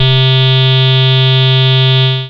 synt, Juno-2; Giga Samples Collection; Bass

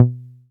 SYN 303, Roland TB-303

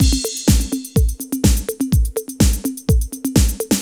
Loop, Roland; Sound & Vision - Gigapack I

